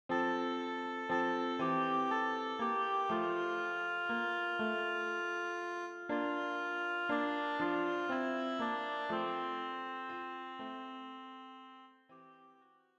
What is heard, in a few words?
Oboe